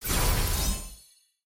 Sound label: levelup; nba